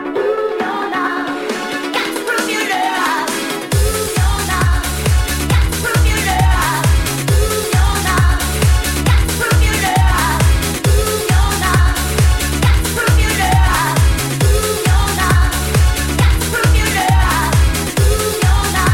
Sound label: House Music